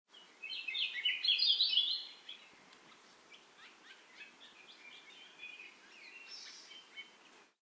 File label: hawaii akiapolaau